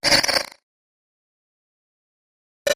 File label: Quick Spit